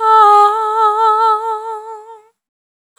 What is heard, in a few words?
Servitude Dry, vocal samples; Sonic Foundry (Sony Creative Software) - Pocket Diva World Class Vocal Samples; Op-Erratic Loops